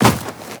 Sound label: Run Snow, Footsteps; Warframe; Tenno